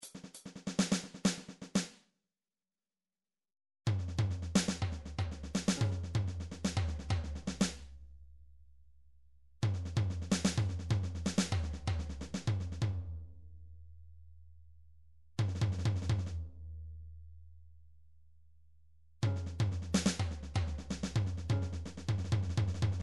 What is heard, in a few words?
drums 125 fill; Paul Kodish Dangerous Drums